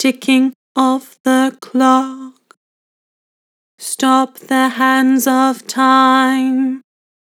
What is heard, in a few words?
Vibrato, Vocals; Harmor Vocals